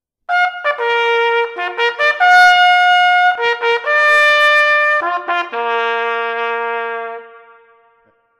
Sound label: bugle call; The 26th Never Failed